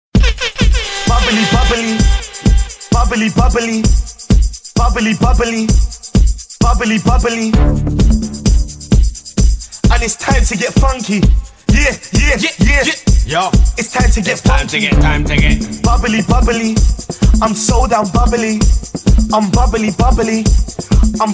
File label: Bubbly Bubbly